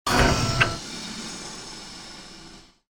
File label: hydraulic door